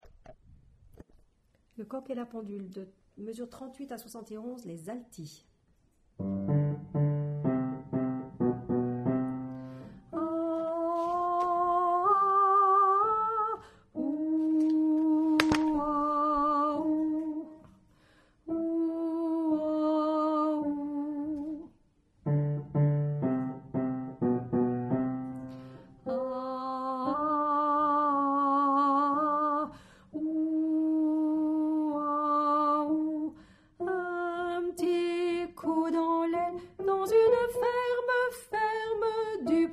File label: Alto